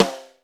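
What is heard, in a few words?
LIT SD, snare drum; Crypton; DrumBase5000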